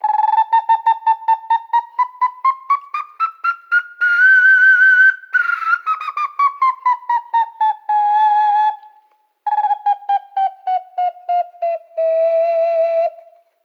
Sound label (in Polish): gwizdek low tone; peruart